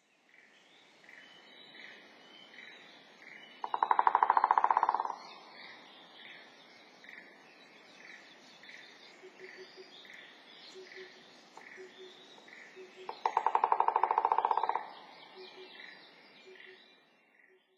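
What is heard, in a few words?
White-backed Woodpecker; nasushio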